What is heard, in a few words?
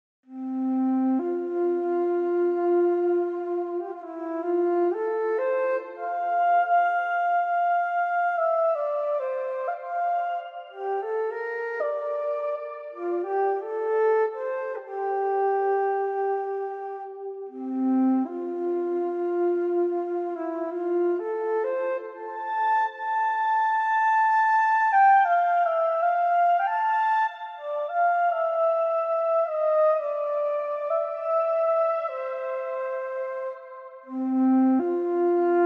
Sax; Traumerai; Schumann; D50